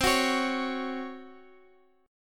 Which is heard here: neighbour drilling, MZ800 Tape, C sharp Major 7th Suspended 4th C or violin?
C sharp Major 7th Suspended 4th C